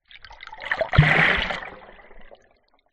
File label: nature water underwater small splash hydrophone; zapsplat